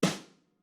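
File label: snare forte, marching; Membranophones; Struck Membranophones; Legacy Snares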